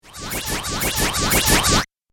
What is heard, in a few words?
Bulding Up Laser Motion Beam; Sci-Fi